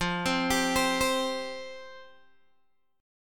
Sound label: F Suspended 2nd F Guitar Open G